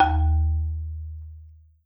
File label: Marimba D#1